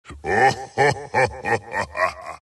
wraith laugh; dota; skeleton king

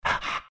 pant big, greevil; courier

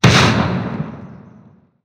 projectile impact; techies

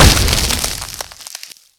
cold feet tick; weapons; dota; ancient apparition

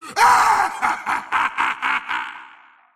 wheel laugh; dota; razor

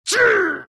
axe pain; dota

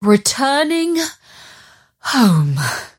teleport pain; dota; mirana

